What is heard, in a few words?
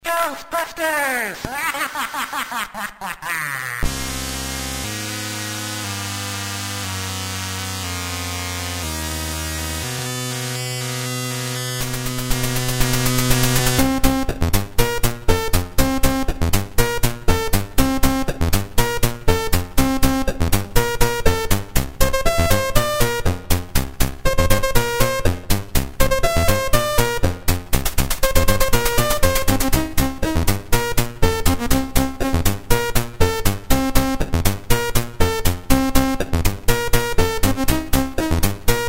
c64; tono ghostbusters